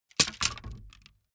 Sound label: wood sticks small break